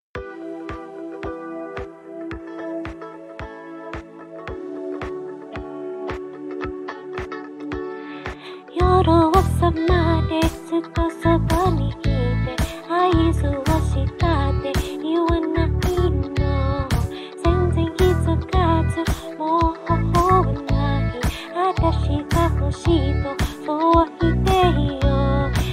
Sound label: (Japanese version) [singing], Japanese